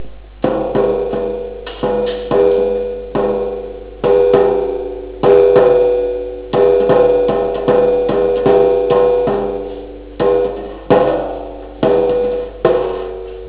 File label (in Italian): tamburo